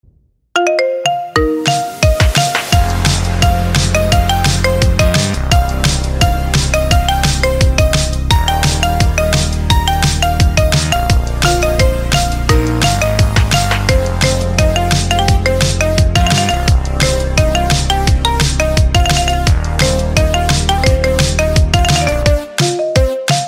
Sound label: Marimba Remix